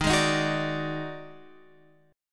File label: D sharp Minor Major 7th Double Flat 5th D sharp Guitar Open F; strummed